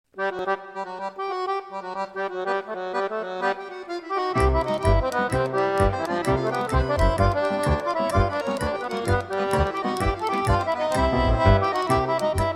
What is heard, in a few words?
accordion duet